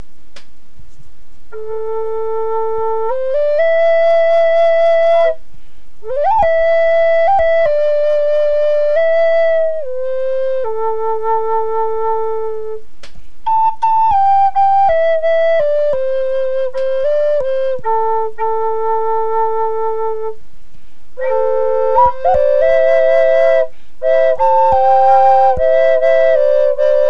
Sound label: A Drone Butternut Red Ceder, flutes